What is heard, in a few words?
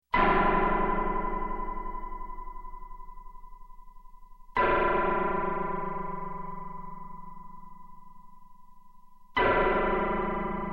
Space Space Chime, Several Hits, Electronic; Sound Ideas 2000 Series